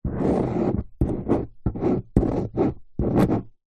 office pen ball point draw diagram paper on plastic table